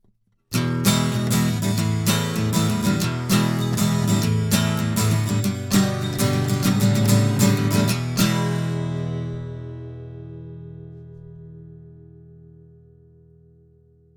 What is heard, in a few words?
Acc Gtr vintage nickel; Warm Audio